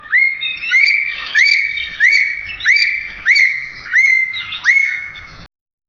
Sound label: wildlife elk call, animal communication